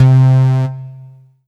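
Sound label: Yamaha TG100 Bass and Lead C3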